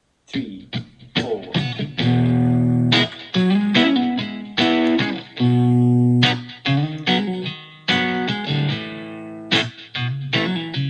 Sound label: style major chords, guitar